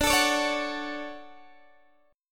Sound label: D Major 7th, D, down, Guitar DADGAD